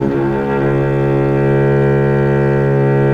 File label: C#1, VIOLE, Historical Instruments; USB Soundscan vol.23